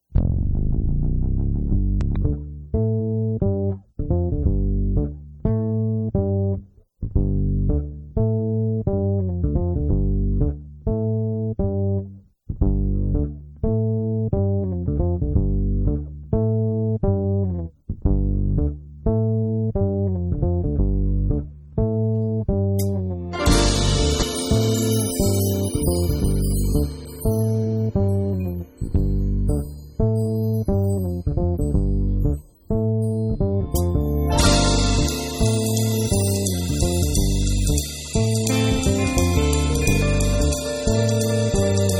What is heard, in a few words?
scifi